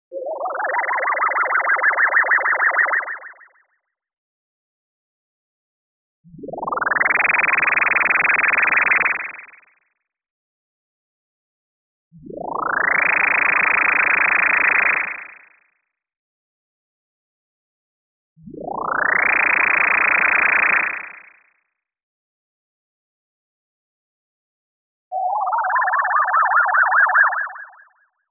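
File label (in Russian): energy accumulation effect, energy waves, gas waves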